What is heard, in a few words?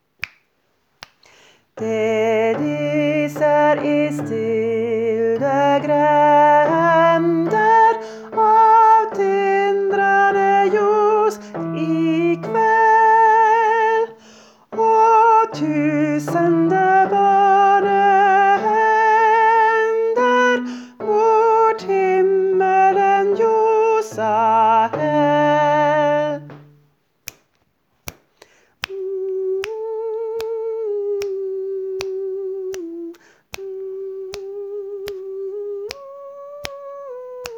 Bass; Det lyser i stille Julekvel